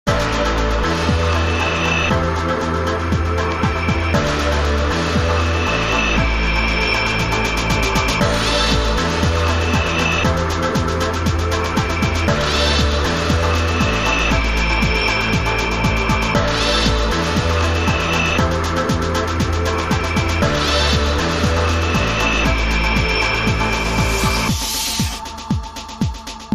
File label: Engine